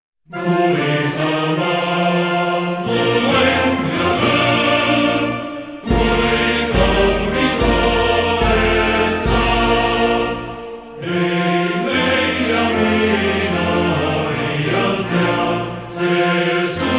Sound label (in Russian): gimn estonii